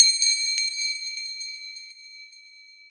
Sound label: a sci-fi bell; documentry; interface